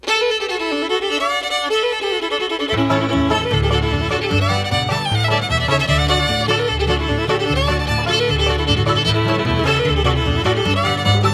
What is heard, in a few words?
Soldiers Joy, Barn Dance; 2010 Tucson Barn Dance